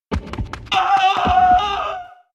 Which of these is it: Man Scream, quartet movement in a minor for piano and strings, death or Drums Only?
Man Scream